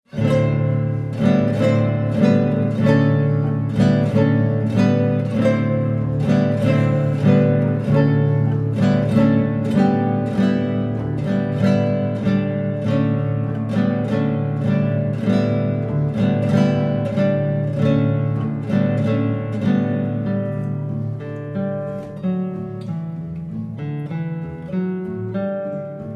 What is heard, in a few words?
guitar brigade